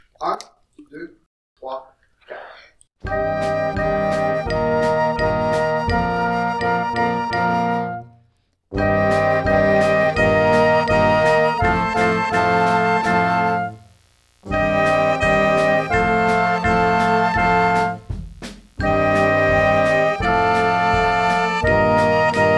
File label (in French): accompagnement du bel hommage a noire a 80